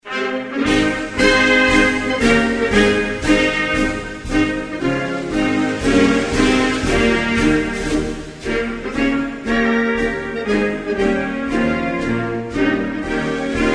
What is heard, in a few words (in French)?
Indonesie, Hymnes nationaux